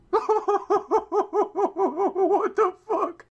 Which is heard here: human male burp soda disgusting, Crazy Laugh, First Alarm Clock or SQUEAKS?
Crazy Laugh